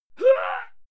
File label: female struggle; koerpergeraeusche; menschen; soundpool